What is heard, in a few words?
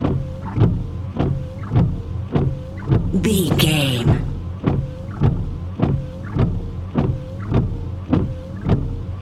car windscreen windshield wiper fast loop